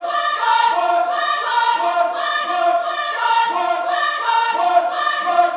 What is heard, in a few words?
CIRWASH, Gospel, CIRCLE LPS; Vocal Planet Vol1 Gospel